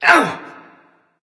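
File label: non verbal ouch, voice; cernunnos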